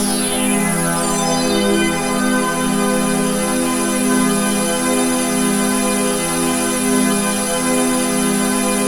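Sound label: chord pad, Pads; Best Service; XX Large - Pads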